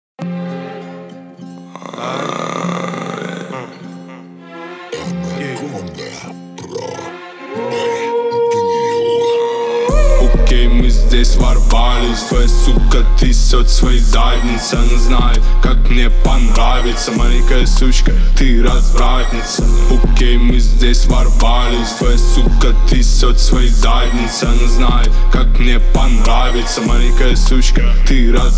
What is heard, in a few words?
31-35hz Low Bass; Studiya avtozvuka anakonDa pro